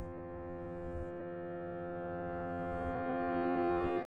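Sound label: piano Room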